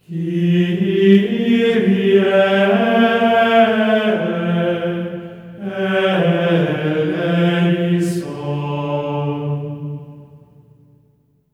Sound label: KYRIE G4, voices; Spectrasonics; Symphony of Voices; GR KYRIE